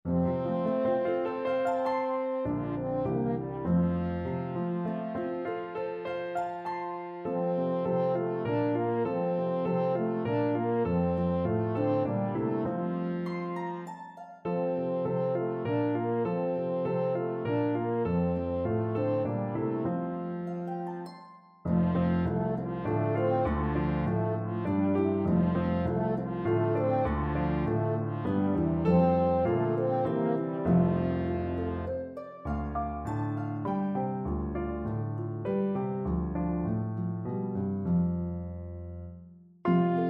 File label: Trio Horn; Come Shepherds Rise